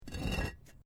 Brick Medium Hollow Move

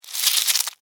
Insect Move Wet, Bug; Animal, Insect, Movement, Wet, Rip, Bug

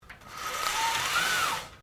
Blinds Venetian Raise Slowly, Household blinds; Living Room Sound Effects; Living Room (Sound Effects:Household)

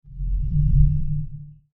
Sub Bass Pulse Whoosh; Whoosh Sound Effects; Andrew Fleming

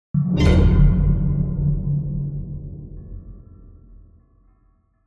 Hit Swell Shock; LYN115 Trailer Production Elements

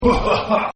Evil Laugh Short; Horror; Partners In Rhyme

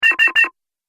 Multimedia Triple Button; Andrew Fleming

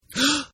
Gasp; Partners In Rhyme

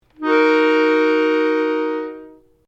PIANO ACCORDIAN G MINOR LONG, Old Piano accordian; Sound Effects:Musical

